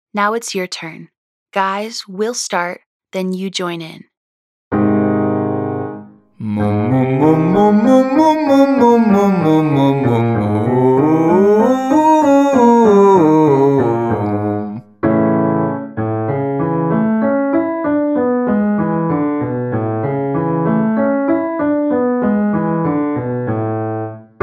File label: Moom Double Long Scale Male